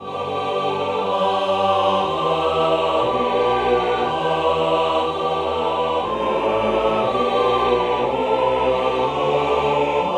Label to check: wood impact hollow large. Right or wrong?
Wrong